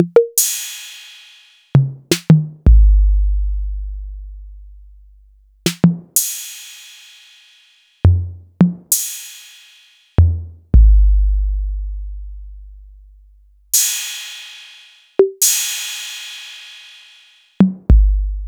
TR-808; Sliced

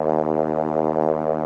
SOLINA, String Machines

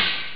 hihat; ST-XX Amiga Soundtracker Sample Packs

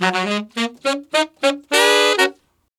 Sax Section in Gb, Jazz; Sonic Foundry (Sony Creative Software); Jazz Solos and Sections

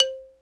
XYLO

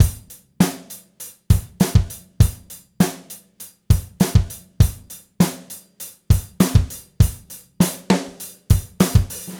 Gretsch groove 90 BPM, 1967 Gretsch Kit drums

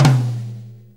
Blues Tom Flam; Sonic Foundry (Sony Creative Software); Loops & Samples Drum Tools; Flams

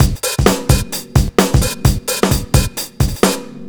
Live Breakbeat Fill, Drum Loops 130bpm; Zero-G; Soundclash Nu Dance Electro; Acidized Wavs

